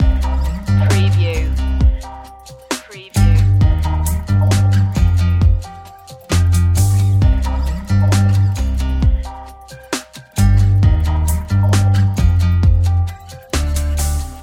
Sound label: electro funk loop